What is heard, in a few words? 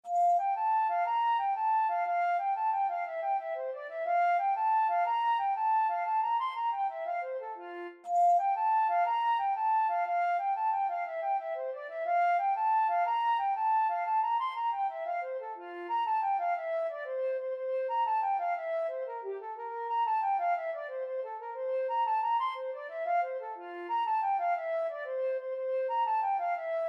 flute